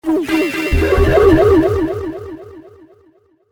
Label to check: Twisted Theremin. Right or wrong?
Right